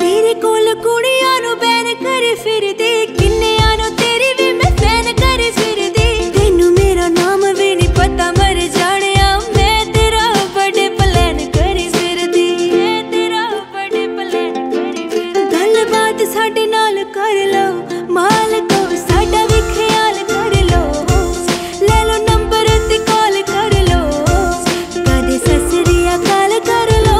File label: Cover Song, Punjabi Songs